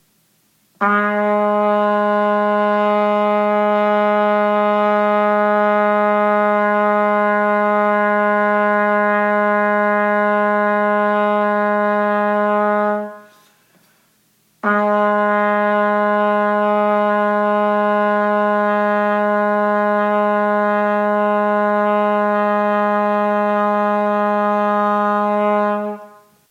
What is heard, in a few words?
Long Tones Low Bb, trumpet